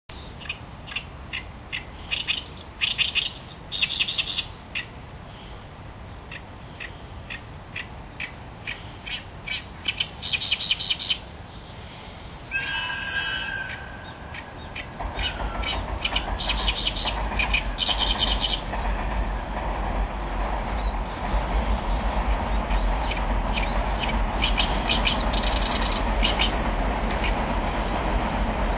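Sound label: oyoshikiri, great reed warbler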